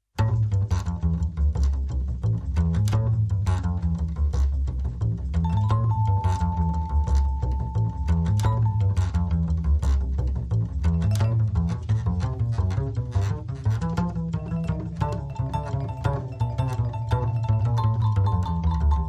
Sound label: Swing Vibe